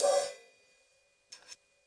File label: crash choke quiet; Crashes